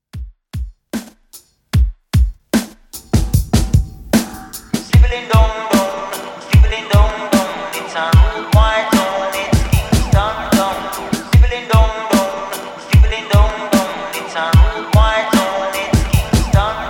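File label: Reggae Redrum 75Bpm Clean; djyan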